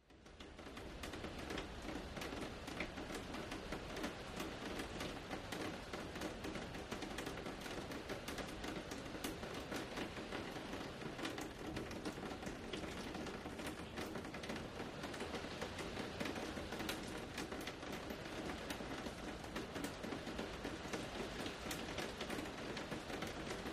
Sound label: Rain Light On Outdoo, int